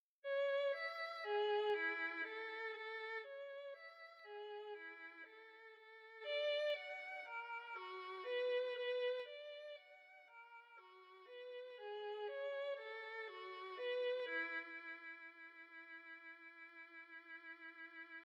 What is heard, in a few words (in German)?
Menuett Scherzo; Beethoven Ludwig van; Skizzenbuch pag. 67